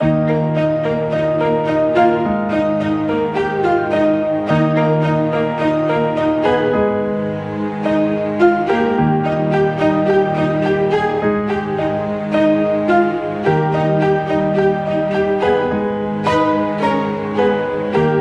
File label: Pop Melody Happy Ending 107BPM; nikos midi pack